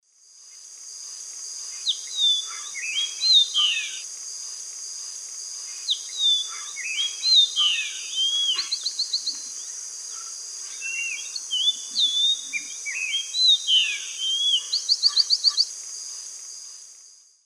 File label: Icterus chrysocephalus, song; S. America; Eastern Lowlands